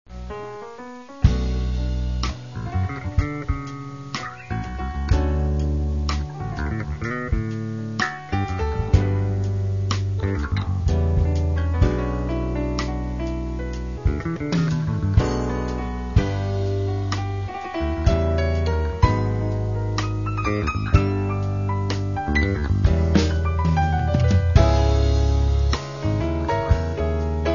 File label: fusion orchestra